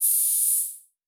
Air Hiss; MISC

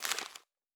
Page, Fantasy Interface Sounds

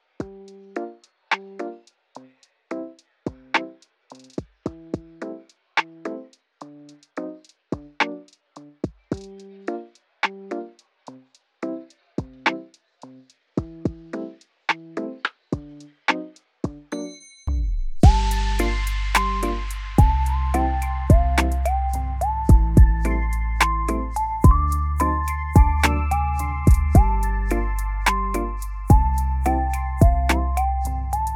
Kawaii Love Lofi Music; Kawaii Love Lofi Music Pack 2

